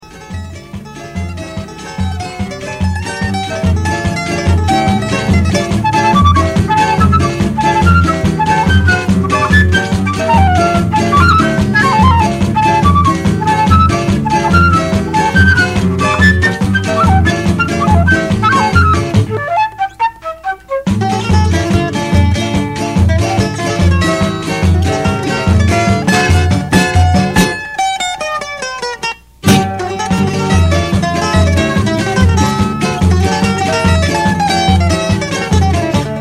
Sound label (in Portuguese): Chorinhos, Flauta